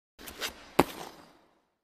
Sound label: Ice Skating Jump Lnd